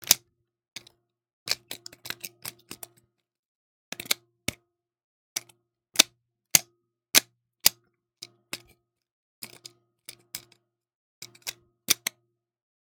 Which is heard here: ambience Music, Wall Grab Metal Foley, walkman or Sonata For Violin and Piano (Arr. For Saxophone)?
walkman